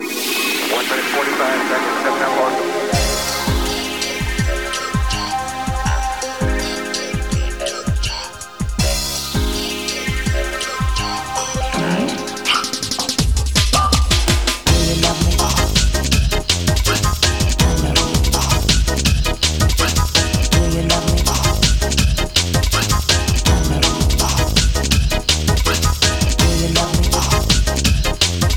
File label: Bossa; Technic Beat; PS2; SB02 ~Bossa Antique~